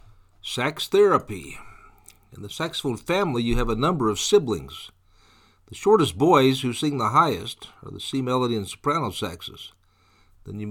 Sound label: sax